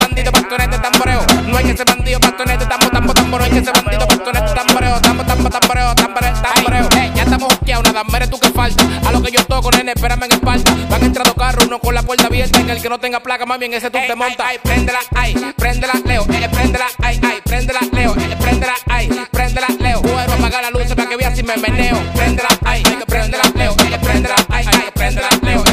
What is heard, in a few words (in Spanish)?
tamboreo; Klingelton